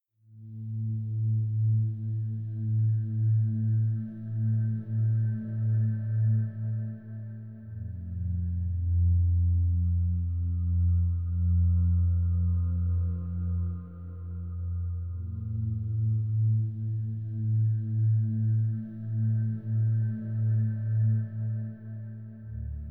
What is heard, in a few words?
Languid evening by the fire Ambience; Next Day Survival